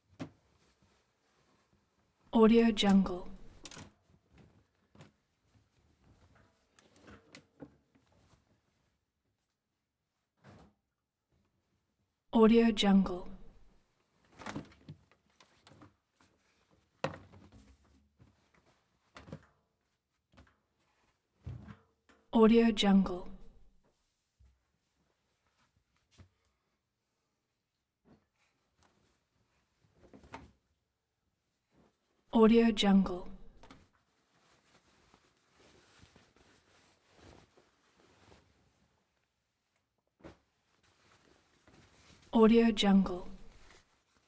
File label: clothes into washing machine